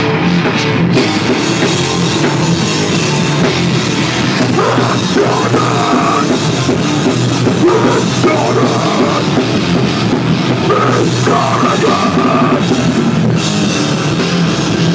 french hardcore band

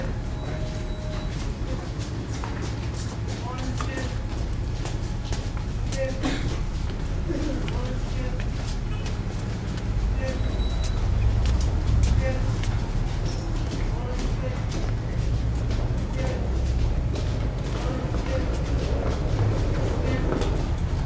green park tube

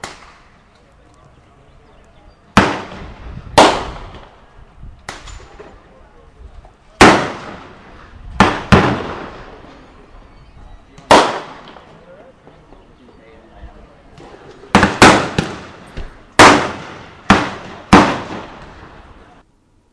shooting

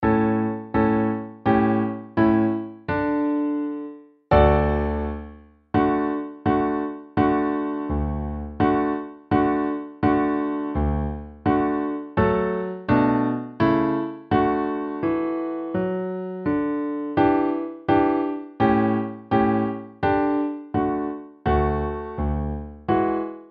violins jingle

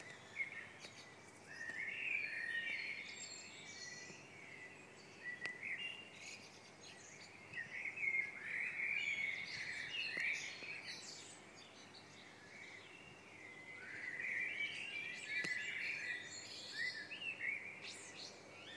birds a tweetin 5am

